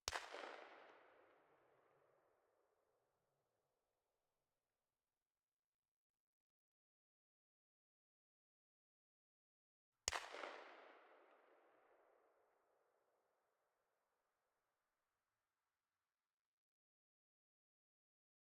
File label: Desert Eagle - FIRING - 65m Right; Pole Position; Desert Eagle .44; H3VR